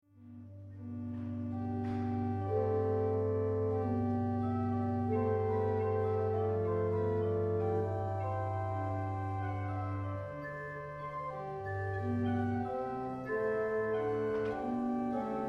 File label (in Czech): mechanický nástroj z roku 1834, dva manuály, 14 rejstříků, varhany; Má dva manuály, 14 rejstříků a pochází z varhanářské dílny místní rodiny Ghutů